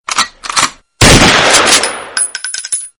short gun ringtones

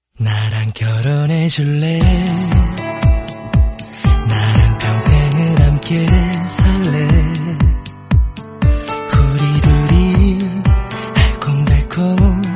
remix English song

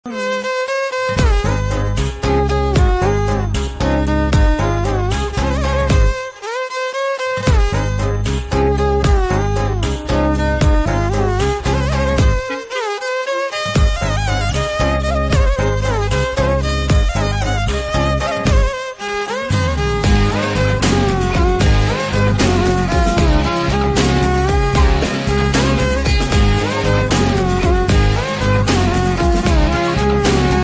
tamil bgm; gemini ganeshanum suruli raajanum

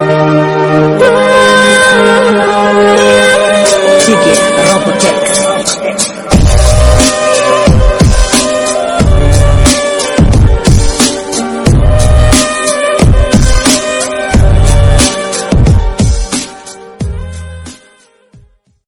sad song bgm sad bgm

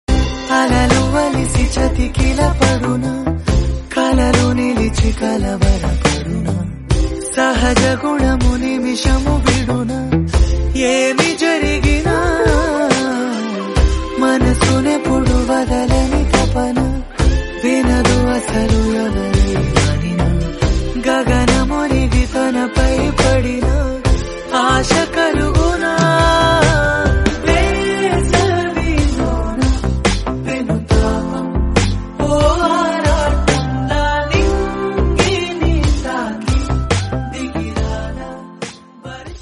telugu webseries bgm; dear kavya

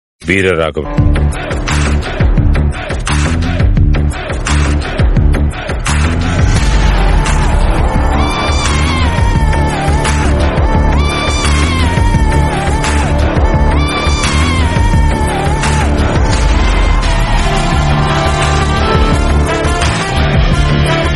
bgm, theme; veera raghavan; beast

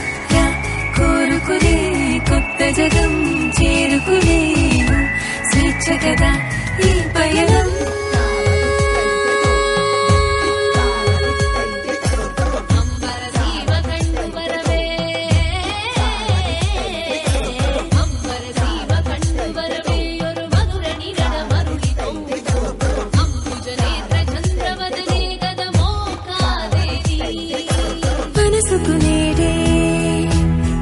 whistle

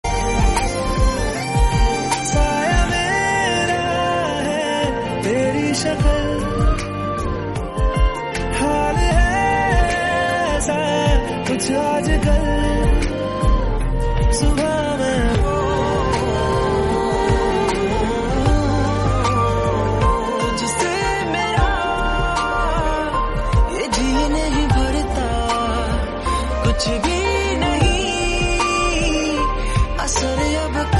remix hindi song

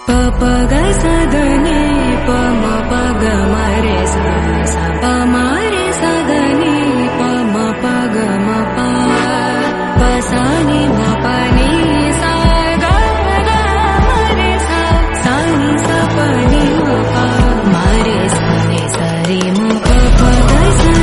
tamil bgm theme

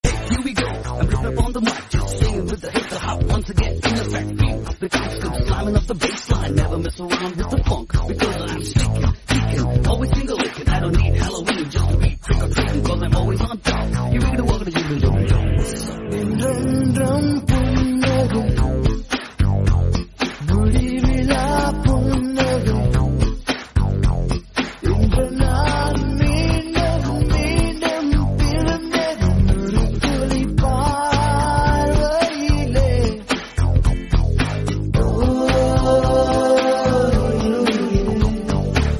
bgm south

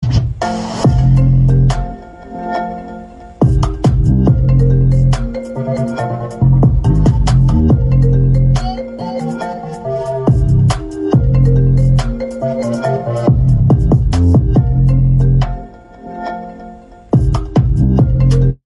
iphone remix trap

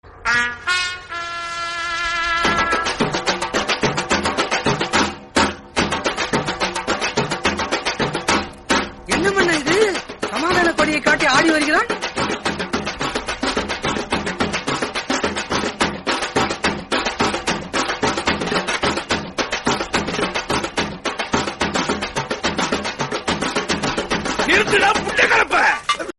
theme, bgm; pulikesi; vadivelu

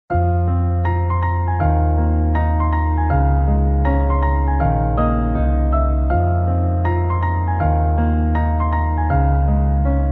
flute beatbox instrumental; naruto

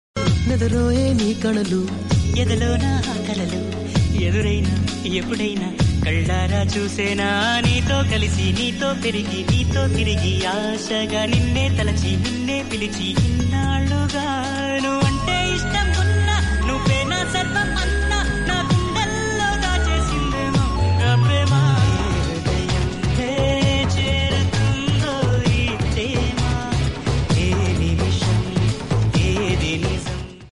movie song

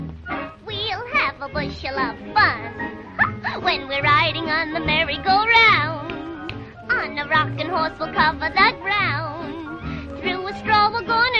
fun song

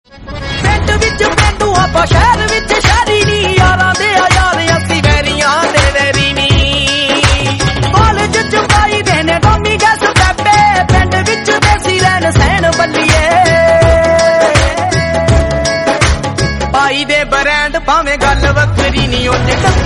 punjabi

